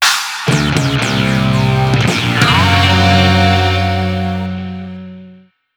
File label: Defeat Jingle